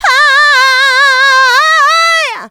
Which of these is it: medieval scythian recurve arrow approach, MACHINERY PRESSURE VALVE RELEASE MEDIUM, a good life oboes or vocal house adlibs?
vocal house adlibs